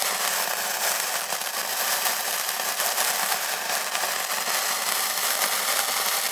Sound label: Sparklers